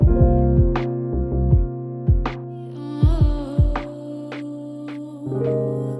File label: Tension Pad; BB 2HTC Samples Vol 4; Pads